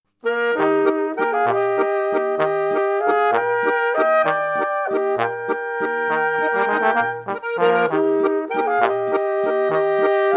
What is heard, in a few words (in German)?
Notizen Walzer